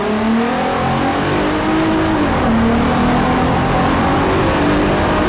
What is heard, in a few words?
second, vehicles; gtr; TDMCarpack; TDMCars